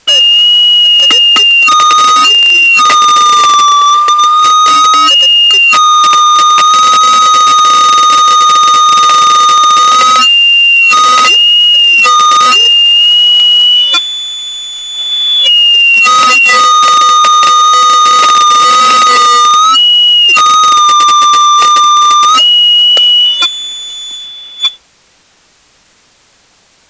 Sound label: Feed Back